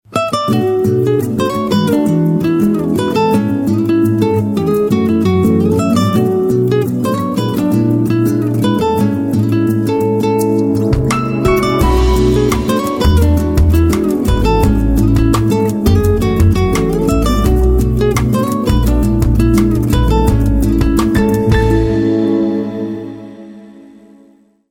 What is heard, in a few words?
nokia tune new generation